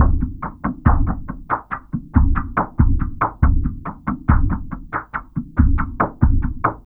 Underwater, Drums & Percussion, Electronica, Jungle; Sonic Foundry (Sony Creative Software); Electronic Point Blank